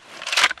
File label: reload; wpn mgl; Titanfall 2